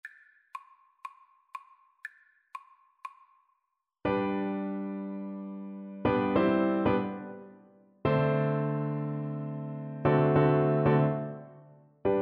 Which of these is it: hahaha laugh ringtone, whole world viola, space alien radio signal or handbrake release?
whole world viola